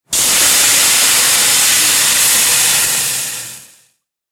water pour water in hot pan after cooking; sound effects one

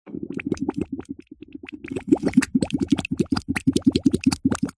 wet mud air blow into small bubbles; foley

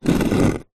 flower pot heavy clay slide drag hard ground; household

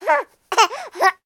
human baby 3 months happy vocal; sound effects one

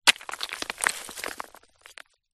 rock small roll down pile edge; foley

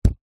food courgette set down on plastic chopping board

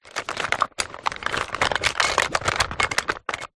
household makeup in pouch rummage; zapsplat

